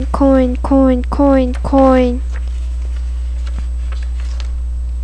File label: coin